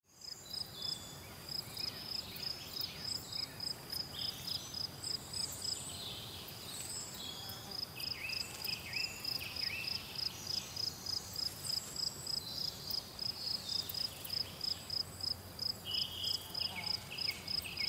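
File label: sound of nature and butterflies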